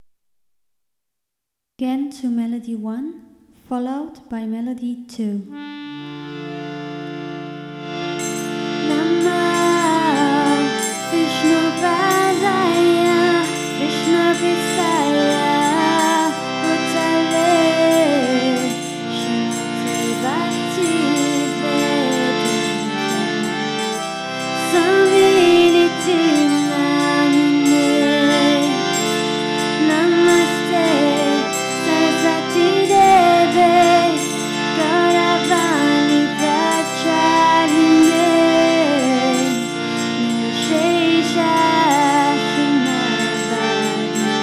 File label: melody, easy harmonium; sankirtan